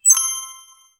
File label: ui crafting menu opening